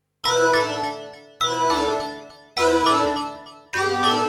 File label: Synt; FUNKACID1